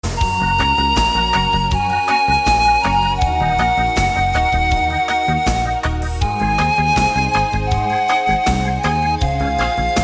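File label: ocarina moonlight reggae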